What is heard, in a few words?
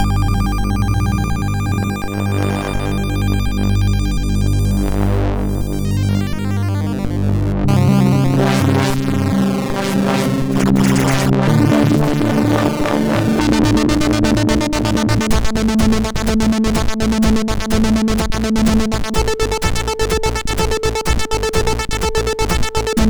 Morse Code, MOS6581R4